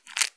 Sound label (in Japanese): 深めにストローク, ピストン運動 激しめ